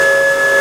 Servo High LOOP

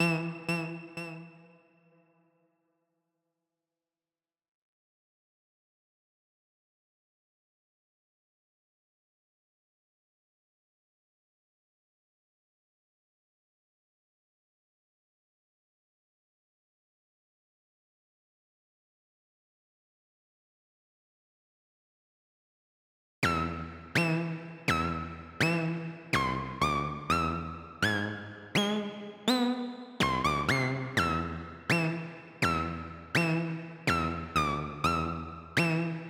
Psychedelic Mix Stem Loop Layer; Centipede Recharged

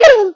Football head poke recover Sfx

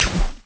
Cat launch shoot

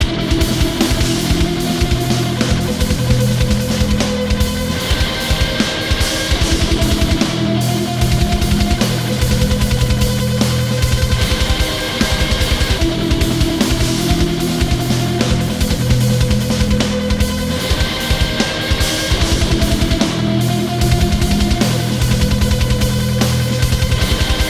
Mx Bridge Fight Lp

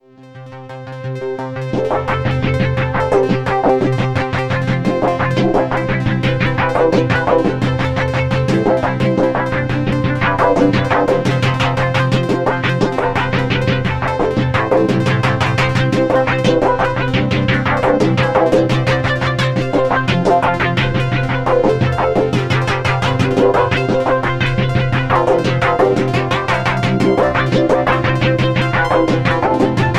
Club Theme

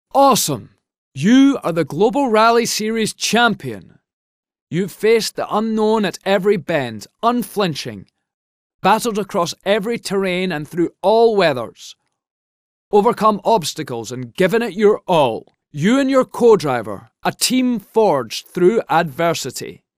Video Victory

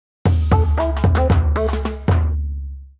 Mine Cart Cave - Lose; Mini Mario & Friends: amiibo Challenge

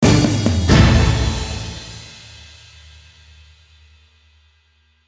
Final Round Stab; Stikbold! Dodgeball Adventure Deluxe

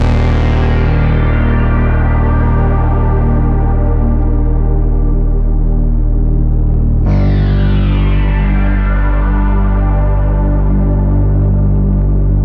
City Ruins Stem; Epitasis

